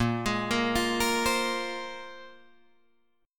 Bb 7th Suspended 2nd Bb, Guitar Drop D

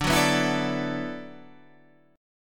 D 6th Add 9th, Guitar, DADGAD

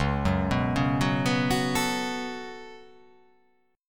C sharp 7th Suspended 4th Sharp 5th

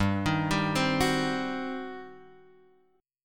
G Major 7th Suspended 4th Sharp 5th, G, down

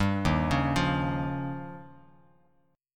Eb 9th, Bass Standard; arpeggiated; JGuitar

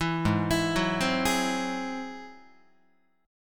Ab Augmented 7th Ab down Guitar; Ab+7 arpeggiated; JGuitar

